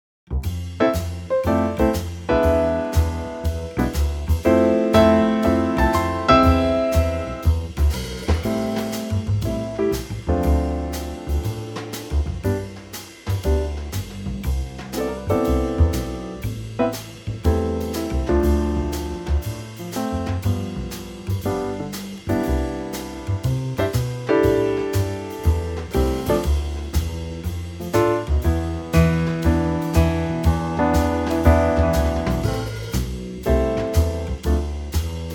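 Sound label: A minor Trio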